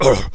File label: pain; player